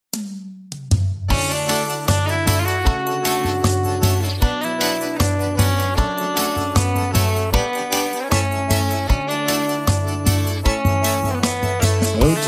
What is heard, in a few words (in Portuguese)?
cancao de ninar